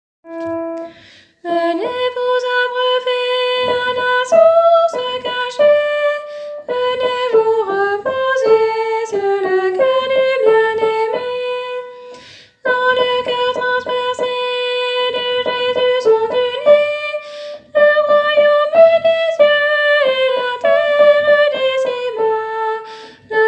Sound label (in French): Venez vous abreuver Tenor